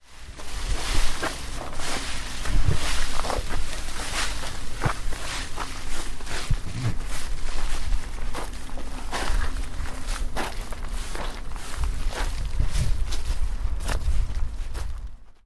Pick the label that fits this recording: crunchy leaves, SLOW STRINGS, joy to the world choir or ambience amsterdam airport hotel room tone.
crunchy leaves